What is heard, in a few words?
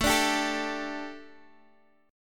A 7th Suspended 4th, chord; x,x,x,x,9,9,9,6